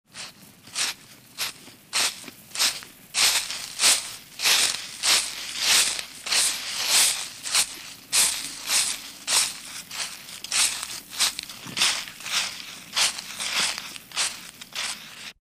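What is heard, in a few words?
footsteps on leaves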